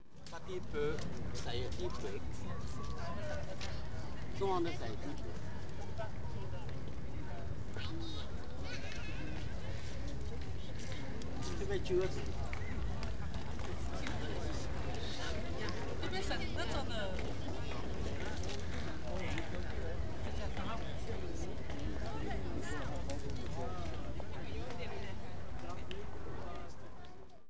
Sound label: late, Soundwalk